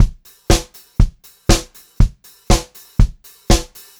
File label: 120 pop beat, Pop-Rock Drumloops